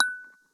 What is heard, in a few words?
Piezo